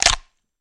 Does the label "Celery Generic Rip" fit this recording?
Yes